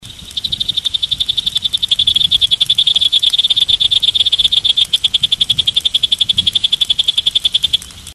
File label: Heterixalus alboguttatus